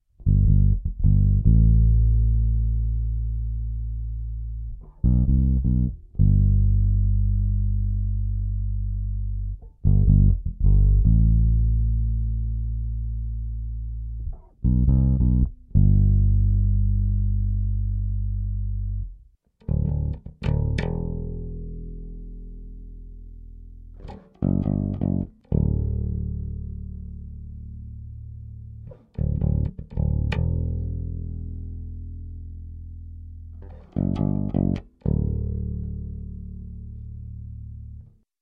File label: sting style riff; bass